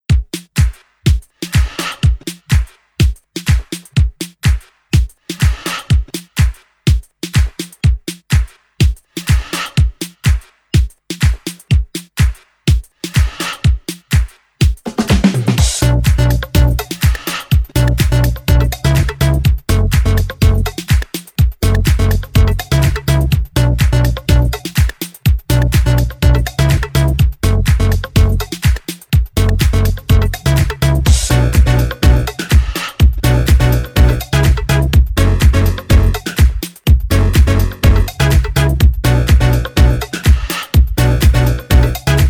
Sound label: Cicada Remix